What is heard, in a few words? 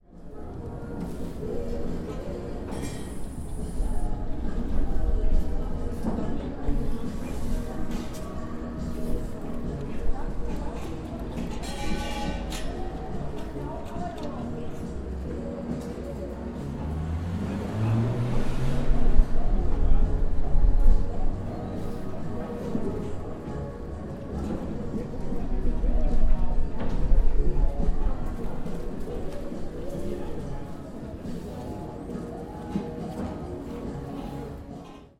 people dismantling market; neuer markt